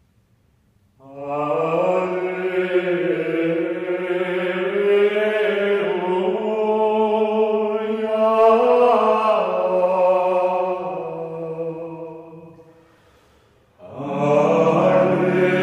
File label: Alleluia SOLO; media Noche; Dic 25 Natividad